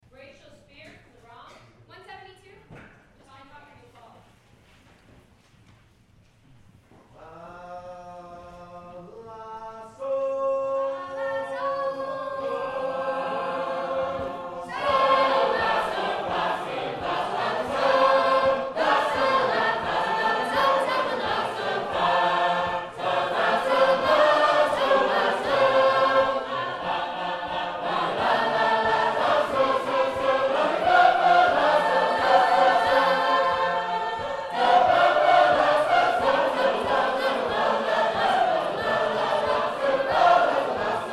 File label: Harmony, sacred harp